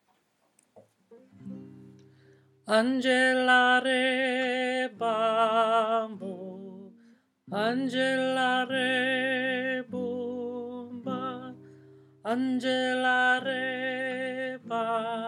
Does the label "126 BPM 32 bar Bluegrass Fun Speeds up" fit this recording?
No